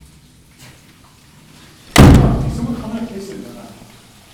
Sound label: Door shut